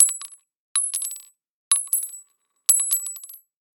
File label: bullet metal casing drop multiple